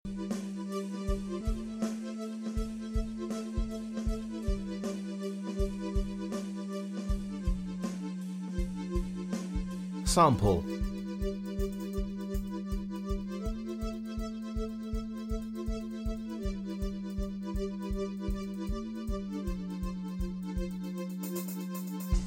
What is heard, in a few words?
Dreamy Groove